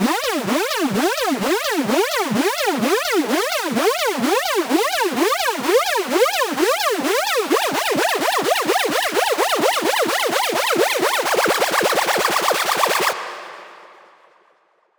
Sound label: Riser 128 BPM E, EDM; FX; Risers; Cymatics; EDM Starter Pack